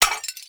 Ferro Pillar Impact Hit; Gameplay; Warframe; Tau; Destructible Dynamic

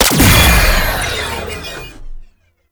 Last Shot, Weapons; Warframe; Corpus; Tenet Quanta

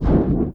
Dash Burst; Warframe; Focus; Operators; Operator Wraith Movement

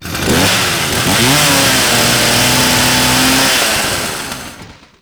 Saw Idle Rev; Warframe; Kuva Ghoul Saw

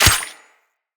Melee Dagger Impact Flesh

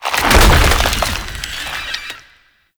Infested Lich Virulence Manifest; Warframe; Abilities; Zeke

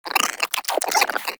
Cryptilex Vocal Idle; Characters; Warframe; Hunting Critters

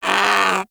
predator vocal pain; Warframe; Hunting Critters; Characters

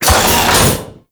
Storage Locker Open; Warframe; Grineer

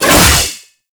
Drill Scythe Whoosh; Warframe; Grn Drill Scythe

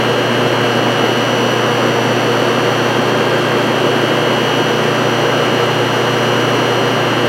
Ocean Steady Machine Loop; Ambience; Props; Warframe; Grineer Ocean

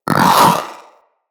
Companion Vocal Bark, Pets; Zanuka

